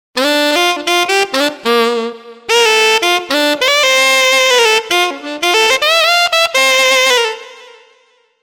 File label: Soul Tenr; TURBO VL